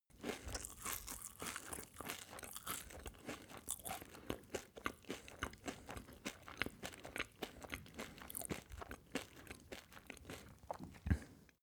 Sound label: male close eat cereals